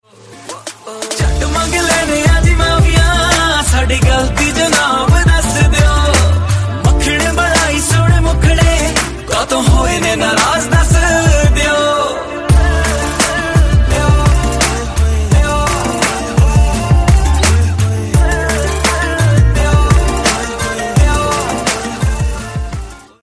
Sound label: punjabi ringtone